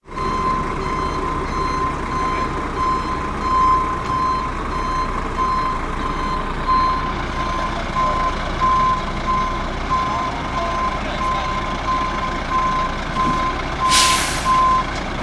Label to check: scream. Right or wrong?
Wrong